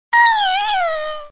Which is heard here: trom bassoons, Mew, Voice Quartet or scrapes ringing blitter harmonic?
Mew